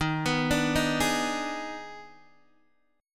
Eb Major 7th Suspended 4th Sharp 5th, Guitar DADGAD; x,6,9,7,6,6; down